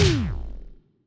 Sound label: Player (Take Damage); Letter Quest: Grimm's Journey Remastered